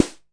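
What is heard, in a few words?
pops snare drum, Korg MiniPops drum machine; DRUM MACHINES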